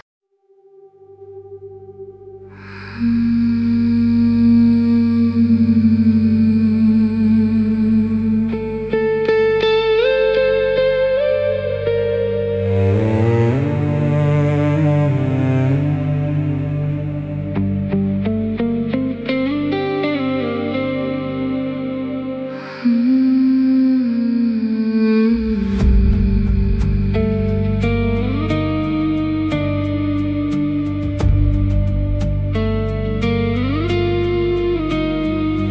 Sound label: Guitars Anthem